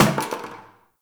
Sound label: Destructible Bucket Impact; Ambience; Gameplay; Warframe; Nineteen Ninety Nine